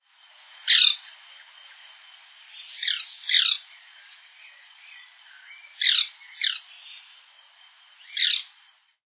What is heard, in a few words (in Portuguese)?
rendeira